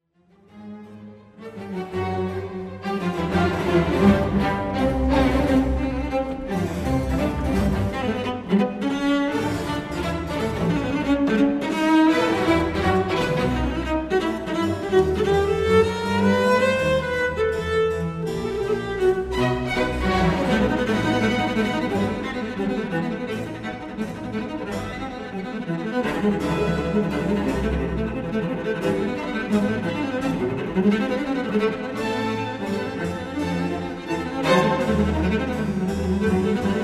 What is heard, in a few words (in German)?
Cellokonzert a Moll; C. Ph. E. Bach